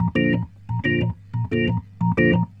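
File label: organ, B-3, 90 bpm, roots reggae; Sounds Good - Roots Reggae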